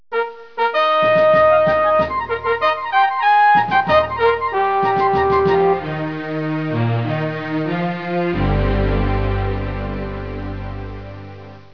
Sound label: musik; bielefeld brown bear